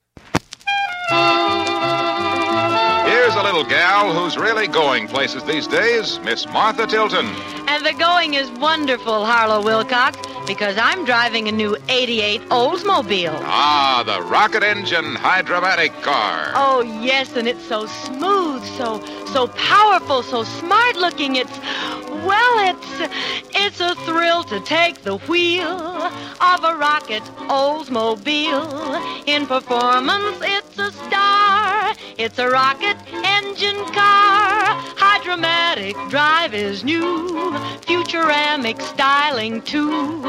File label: oldsmobile commercial; c.June 1950 - Columbia NY NY; Oldsmobile Ad